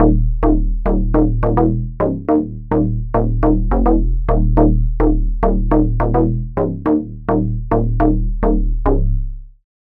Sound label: Bass; Hyman Roth; Routed Sound; Spire Expansion - Noble Gangster Vol.1